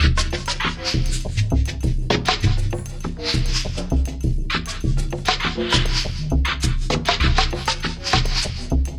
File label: 120 MAAR LP 1, Industrial Loops